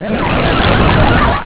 die

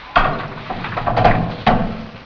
NEW DOOR; TYRAN

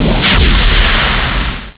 ROCKET; EXTREME

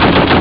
CANNON

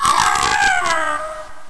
DEATH CRY

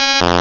GLIDE; VERITECH

